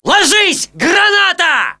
grenade; soviet

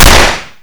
fire

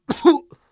minor pain, soviet

